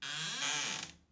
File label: Doorcreak; five nights at freddys the first generation